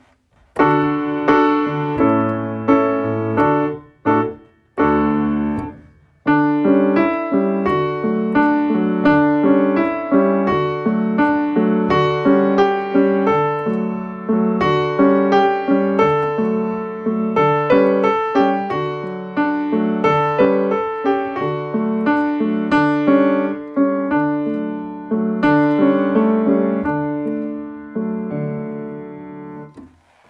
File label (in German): Klavier; Bruder Jakob